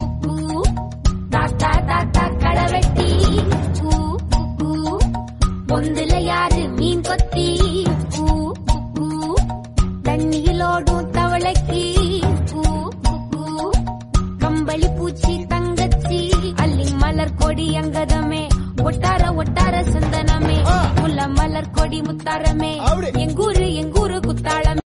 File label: Malaysia Song